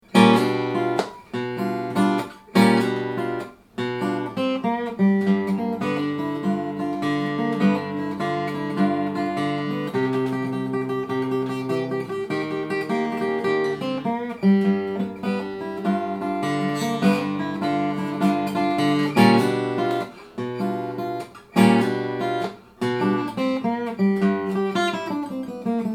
staccato, steel string guitar flattop; tippin

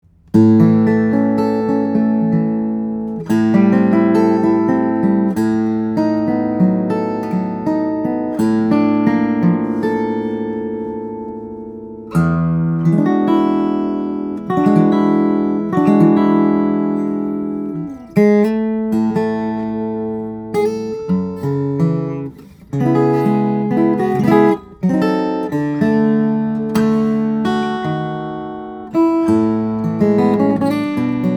guitars; Borges 000 28; all koa; steel string guitars; flattop

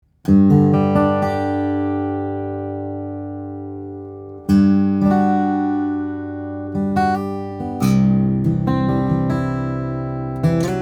steel string flattop guitar; Tom Sands Model L; madagascar rosewood; swiss spruce

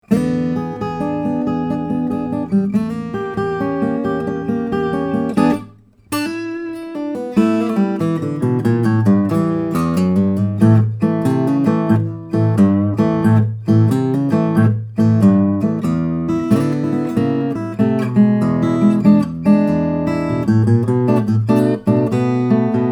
double top, steel-string guitar; dunwell serenade

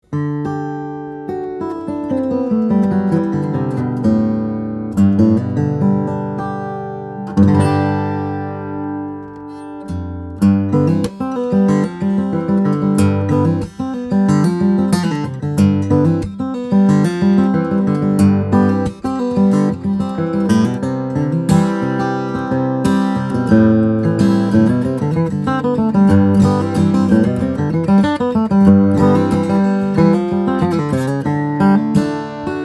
guitars; Henderson OM; all koa; steel string guitars; flattop

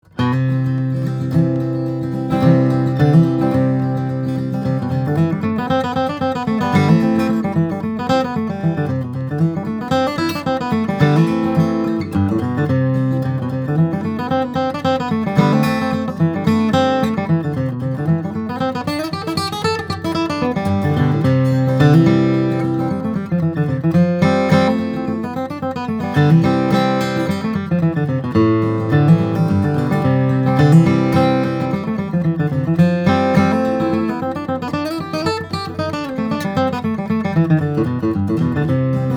guitars; Bourgeois D Vintage Deluxe; indian rosewood; aged tone adirondack spruce

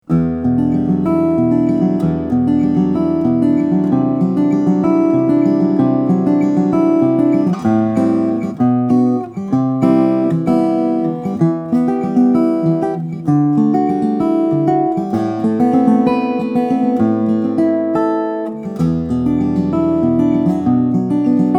12 fret cutaway, steel string guitars flattop; saddle pal; jenkins; lame horse jenkins son instruments

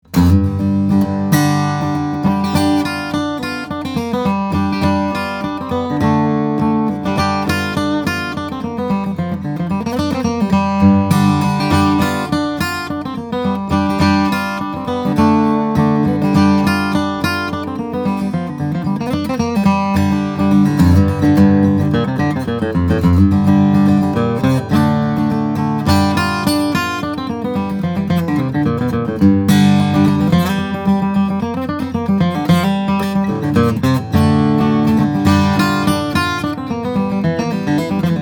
Musser SJ, steel string guitars flattop; flamed mahogany; adirondack spruce

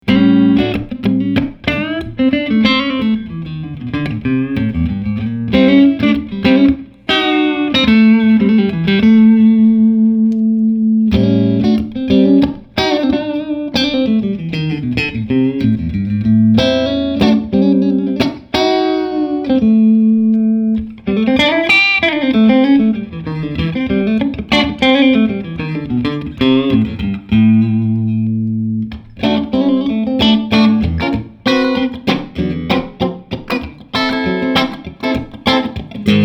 Soderlund Africaster, electric guitars; jgs guitars

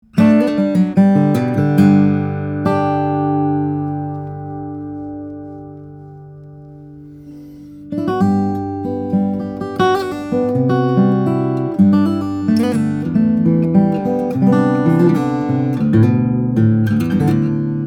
guitars; Beneteau M; honduran rosewood; spruce; steel string guitars; flattop

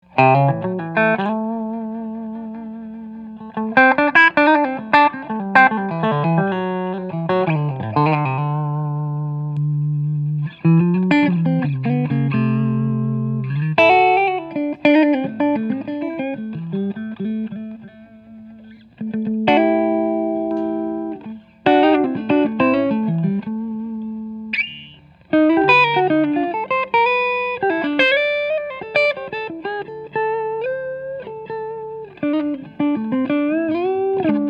guitars; Collings SoCo Deluxe; all mahogany; electric guitars; semi hollow

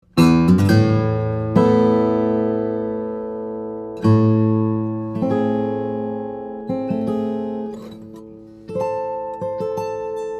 guitars; Olson SJ; brazilian rosewood; cedar; steel string guitars; flattop

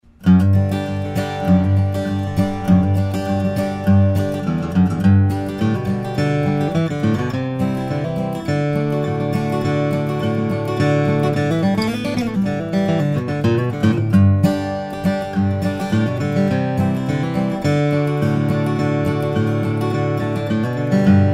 steel-string guitar, flattop; alvarez yairi dym 96 dreadnought

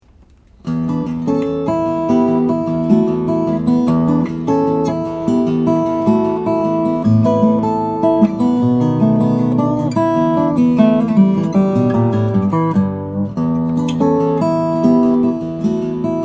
Parlor

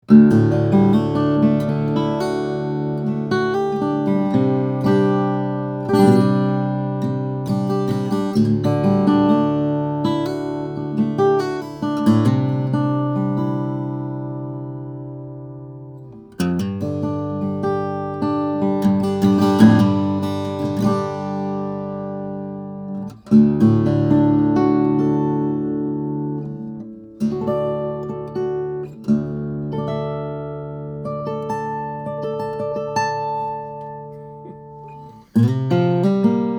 steel string flattop guitar; Collings C10 MPLA SS SB; maple; adirondack spruce

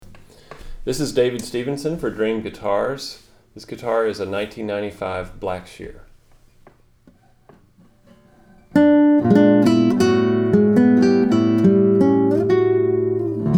classical guitar, nylon string guitars; blackshear; rodriguez

